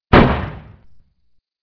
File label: Backfire